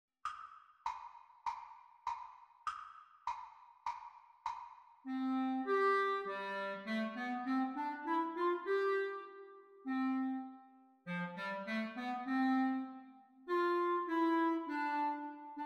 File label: Exam Recording Clarinet Duets; Exam Speed; Grade 4; The Teacher part